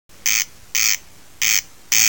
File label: corncrake, birdsong